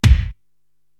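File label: bass drum Ambi Rock, Korg TR-Rack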